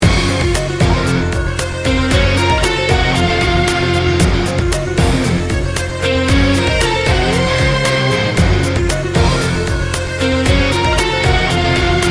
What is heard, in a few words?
bollywood action hero